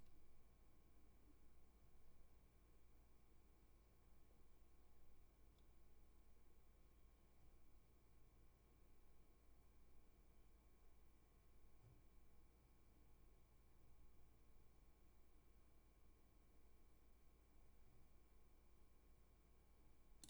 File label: room tone